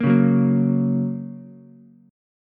Bb Minor Sharp 5th, Ukulele; 3,1,2,1; strummed; chord shape

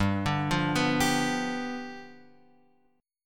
G 7th G down Guitar Open F; G7 arpeggiated; JGuitar

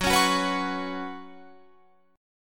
F sharp Suspended 4th, Guitar, Open F; strummed; 18,20,18,18,21,20

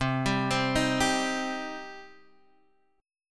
C Minor, C, Guitar, Half Step Down

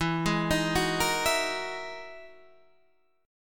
E Major Sharp 11th E, Guitar, Standard; EM#11; arpeggiated; 12,11,13,11,11,12; down; capo=9

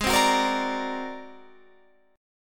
G sharp 9th Flat 5th G sharp; G#9b5 strummed; JGuitar

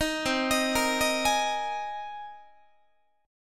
C Minor 7th Sharp 5th C Guitar; Cm7#5 arpeggiated; JGuitar

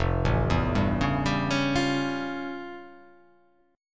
F Augmented Major 9th F

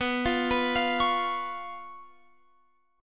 B Suspended 2nd Flat 5th down Guitar Open F; Bsus2b5; arpeggiated

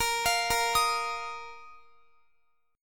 Bb Suspended 4th Bb down Guitar; arpeggiated; tuning 4E,4A,5D,5G,5C,6F

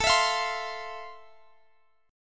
F Major 7th, A

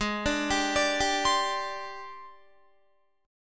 Ab Major 7th Flat 5th Ab, down, Guitar; AbM7b5 arpeggiated

